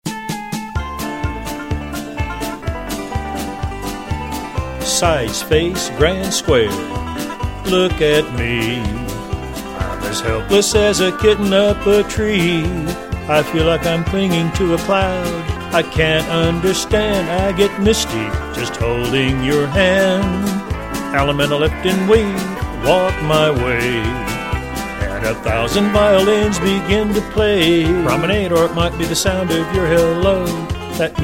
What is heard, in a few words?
vocal